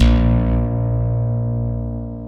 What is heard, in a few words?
STICK A1, Chapman Stick; Analog Odyssey